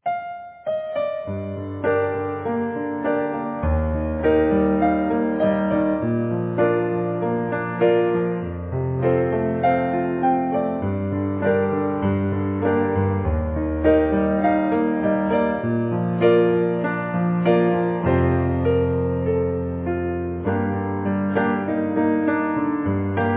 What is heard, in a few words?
Melody